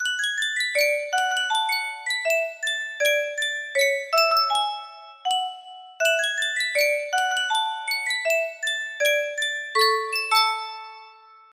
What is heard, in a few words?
sankyo music box, melody